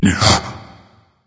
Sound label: surprised; smite; hades